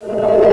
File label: health 8Bit; abbottabad compound